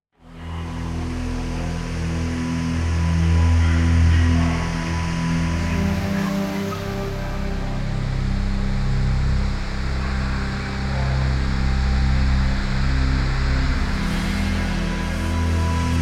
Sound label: Beetlecrab Tempera; Rolling Fantasy